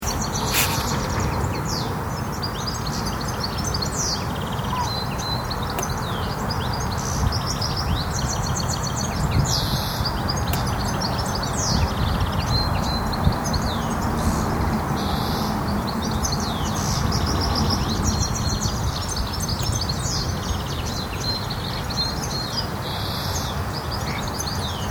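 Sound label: goldfinch, bird song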